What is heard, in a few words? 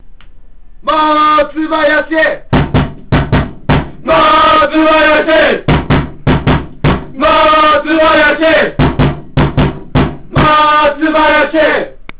call